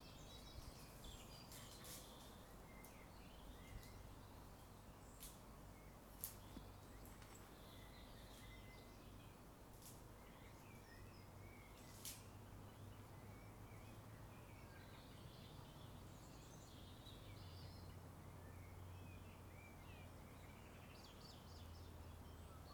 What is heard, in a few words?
Early Birds In The UK